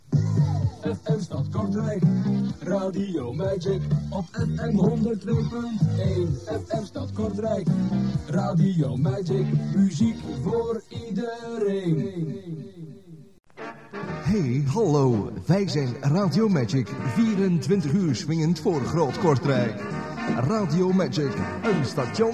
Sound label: jingles; Magic Kortrijk